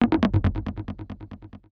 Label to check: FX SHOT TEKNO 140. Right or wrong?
Right